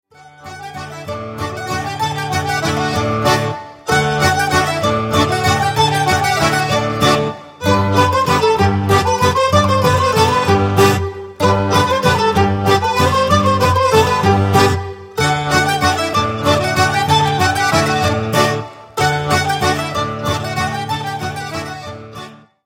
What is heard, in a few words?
mazurka